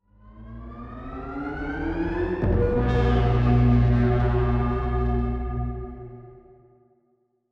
ship construction ship move; Stellaris; shroud; ships

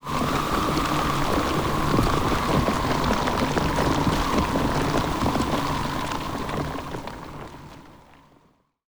cutting winds; rifts; Stellaris; astral planes

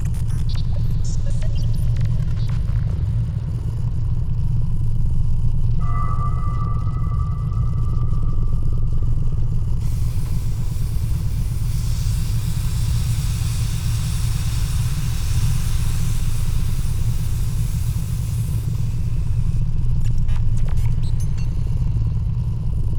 ship science ship idle; Stellaris; toxoids

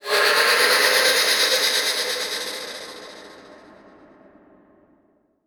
hivemind show planet; Stellaris; ancient relics; world

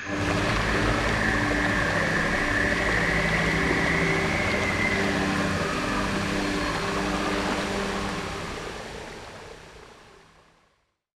tropical paradise; Stellaris; astral planes

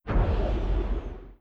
distance energy torpedo fire; Stellaris; weapons

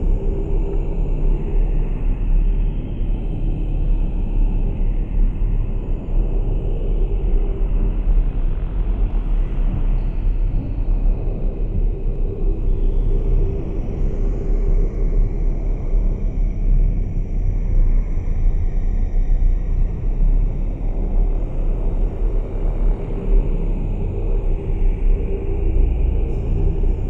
ship juggernaut ship idle; Stellaris; shroud; ships

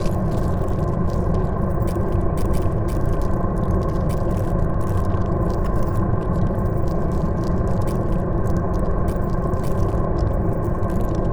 ship mauler idle; Stellaris; biogenesis; ships

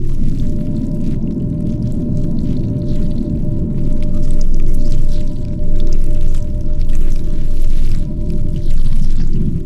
ant ship colony idle; Stellaris; biogenesis; ships

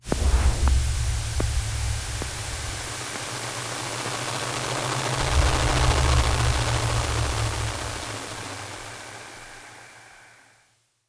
white noise; Stellaris; astral planes; rifts

